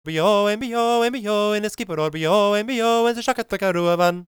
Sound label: GAELIC, World Voices; ILIO; Vocal Planet